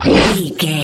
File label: troll monster attack fast